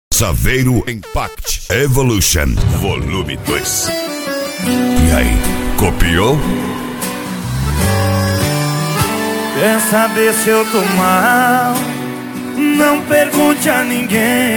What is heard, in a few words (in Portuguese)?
sertanejo universitario; saveiro impact evolution vol2